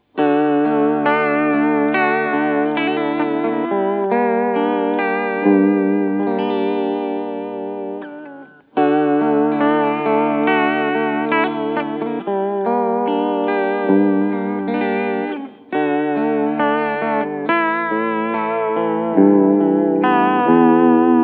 tremolo

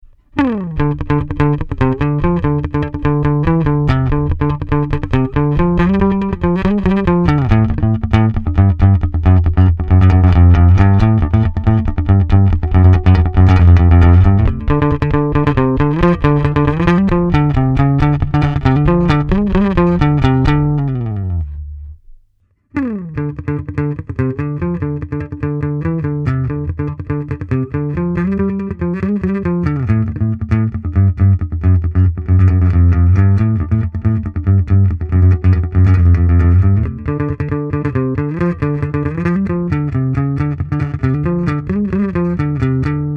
Ampeg PFX02 P Bass Pick

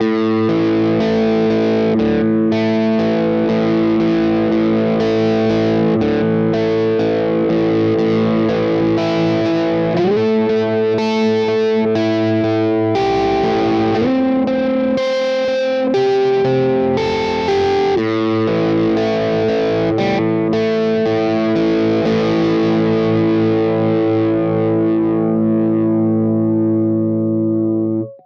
Cort KX 500 Both Voice

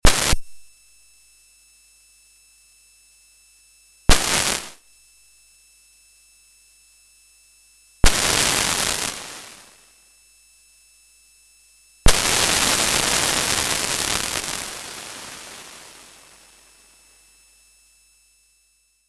Metasonix D 2000 Gated Noise Decay

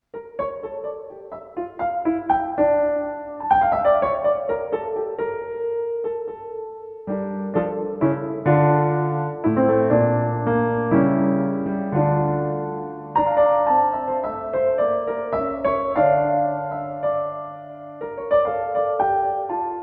CP1 Model 200 Room

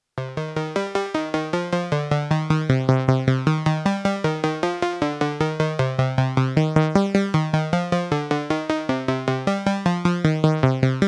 ARP 1601 Skip

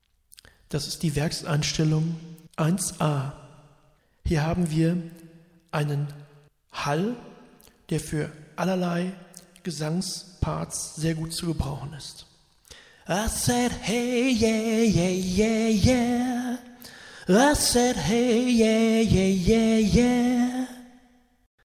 Preset Hall

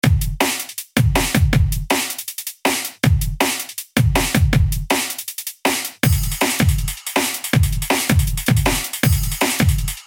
white keys void examples

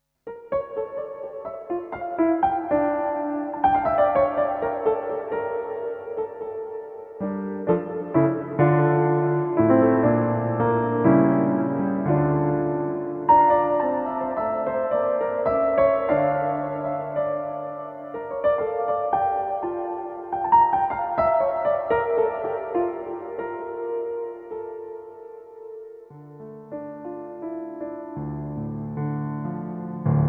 CP1 Reverb 4000 Reverb

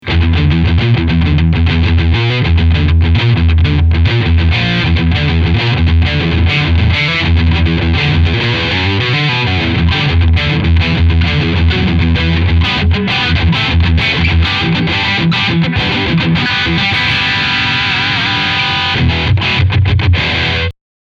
Riff Scooped

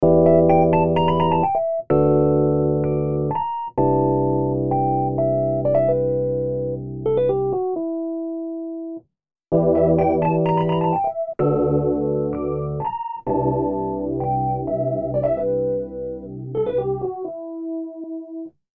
Rhodes

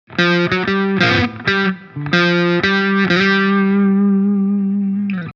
G7 mit Chromatic

